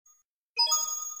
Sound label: yellow coin; also known as gold coins; Super Mario 64; Mario Coin Sound Clips